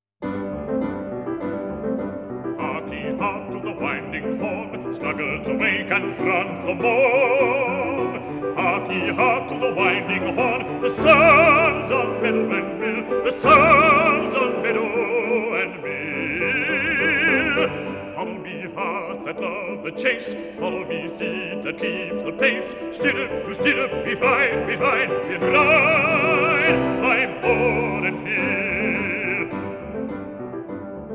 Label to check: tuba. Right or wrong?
Wrong